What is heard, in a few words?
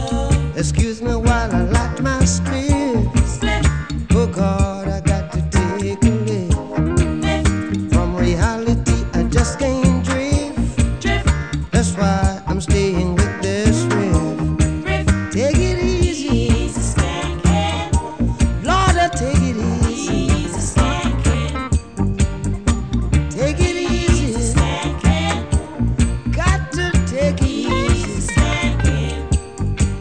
reggae